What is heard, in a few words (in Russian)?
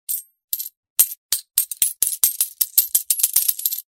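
medlenno brosaut monety, coins slowly dropped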